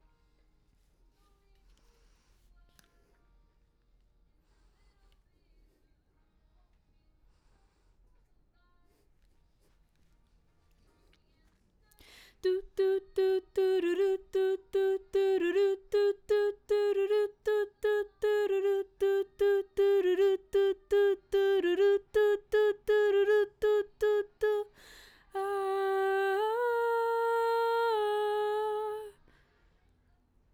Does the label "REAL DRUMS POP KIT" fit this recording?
No